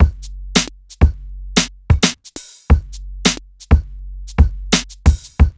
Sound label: BEAT, Burning Grunge Hip Hop